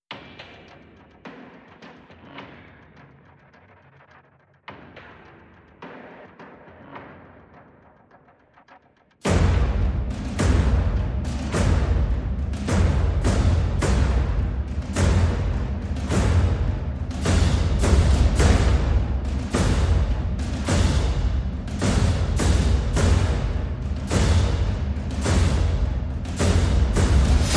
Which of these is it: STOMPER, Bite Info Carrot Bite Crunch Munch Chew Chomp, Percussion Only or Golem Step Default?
Percussion Only